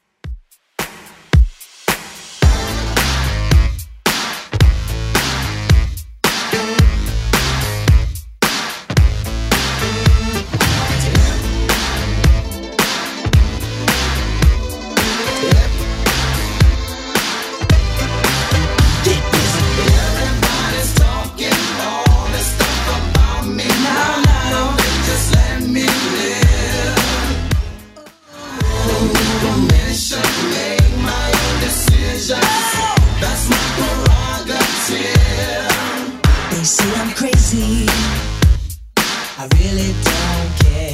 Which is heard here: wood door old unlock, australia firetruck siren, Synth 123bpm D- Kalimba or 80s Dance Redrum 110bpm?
80s Dance Redrum 110bpm